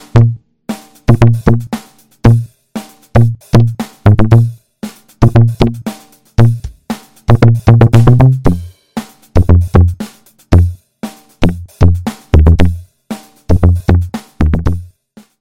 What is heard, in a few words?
BASS Muted; SpheratorFM; syntheway